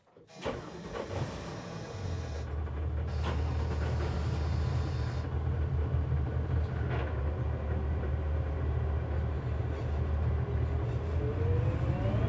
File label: Police Boat Start Drive Shut Off Mic At Rear With Sirens; transportation